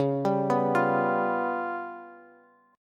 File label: C sharp 7th Flat 5th, Bass; arpeggiated; chord